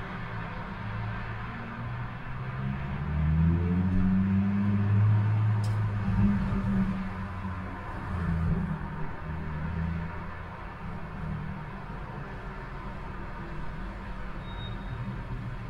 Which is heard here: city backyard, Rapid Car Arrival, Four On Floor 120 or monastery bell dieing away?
city backyard